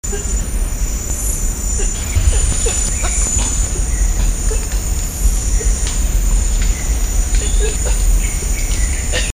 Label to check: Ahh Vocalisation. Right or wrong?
Right